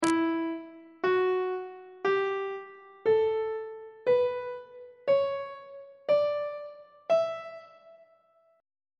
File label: f flat dorian mode; note positions on treble clef